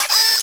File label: tunneler worm turn